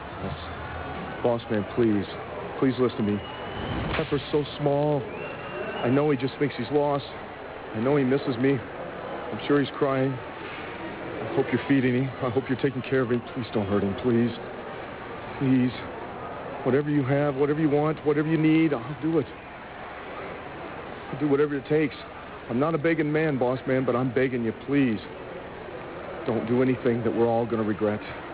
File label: crying